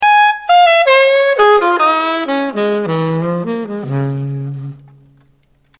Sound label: alto